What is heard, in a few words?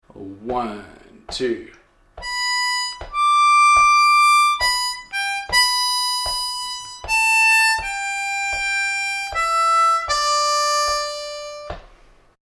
Amazing Grace Upper Octave Lick Slow, harmonica